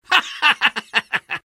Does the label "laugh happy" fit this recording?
Yes